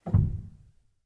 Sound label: rel, Grand Piano; bitKlavier Grand; Piano Bar